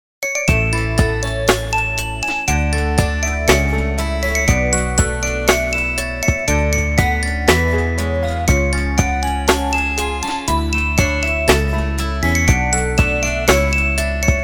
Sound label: Ring; Doro; PhoneEasy-612